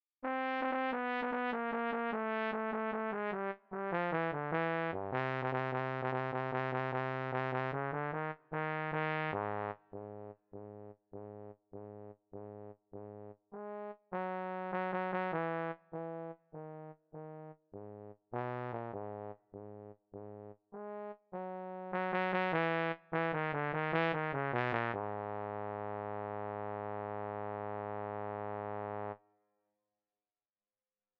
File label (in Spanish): bajo